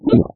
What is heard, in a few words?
drink, witch; Minecraft